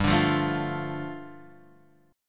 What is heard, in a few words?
G 7th Suspended 2nd G, Guitar Open F; G7sus2 strummed